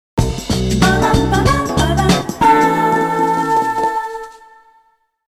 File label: Open The Door; PaRappa the Rapper 2